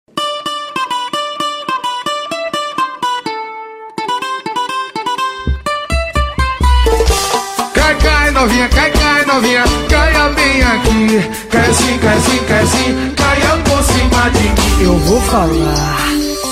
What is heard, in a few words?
jingle